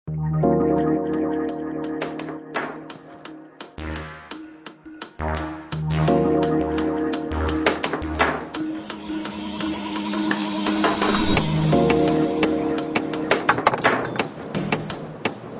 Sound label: Foley Drum and Bass; Primate